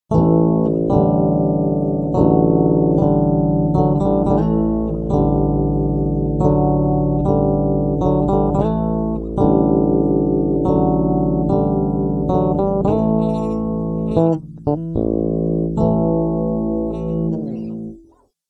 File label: Bridge parallel, bassblaster; rownolegle